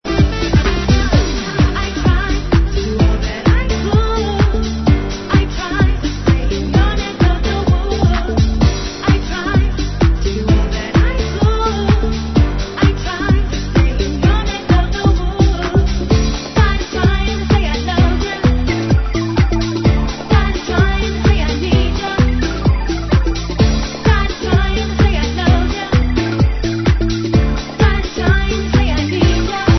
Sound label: voices spring